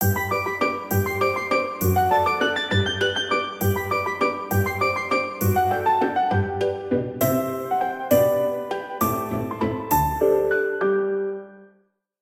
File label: Waltz, alarms; Meizu MX2